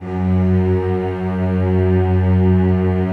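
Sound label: celli sustain f#2; Sonatina Symphonic Orchestra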